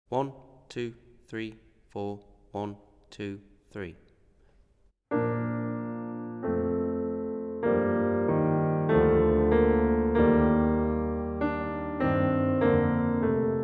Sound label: Eb accompaniment accompaniment only